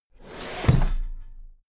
drawer cabinet equipment close heavy halfway

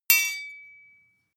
sword hit rattle ring

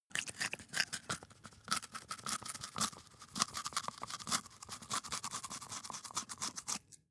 rodent eat sequence

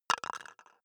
plastic cup drop wobble

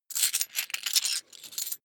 coins big search

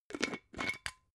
glass bottle massive close

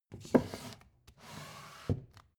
book shelve soft

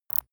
insect clicking

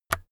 keyboard external key release

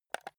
console controller home press

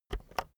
keyboard external enter press slow

